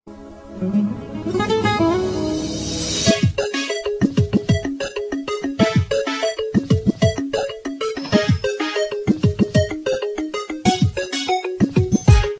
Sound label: sms ringtone